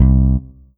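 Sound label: Kawai K5000W Finger Bass 1 C2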